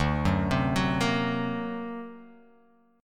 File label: D Augmented 7th D down Guitar Open F; D+7 arpeggiated; JGuitar